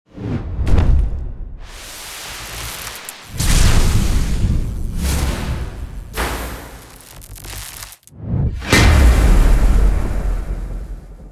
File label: Hammer Fall; Badger